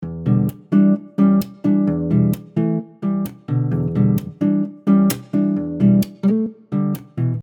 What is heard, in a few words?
Percussive Emin 130bpm, Soft Nylon Guitar; MG Soft Nylon Guitar - MIDI Pack